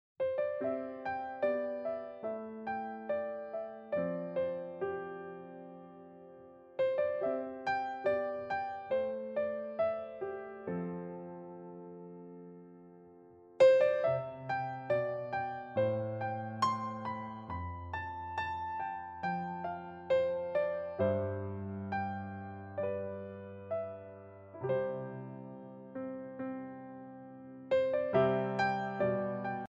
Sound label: Luckynate Piano